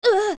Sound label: young hurt; Link getting hurt